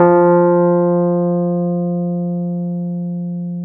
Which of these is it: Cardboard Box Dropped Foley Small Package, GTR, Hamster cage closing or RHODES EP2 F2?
RHODES EP2 F2